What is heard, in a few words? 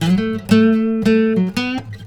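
baritone lick A, Blues Guitar; ILIO; Fretworks; 120 BARI LICK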